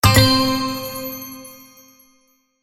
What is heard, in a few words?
quest accept